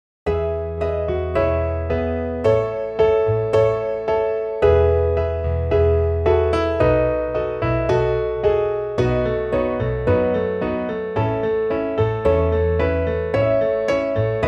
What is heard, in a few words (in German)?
midi, klavier; unter dem himmelszelt